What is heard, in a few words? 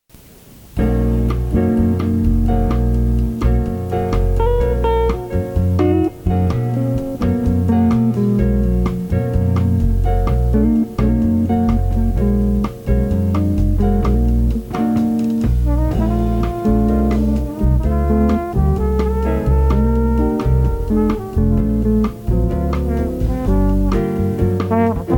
Bossa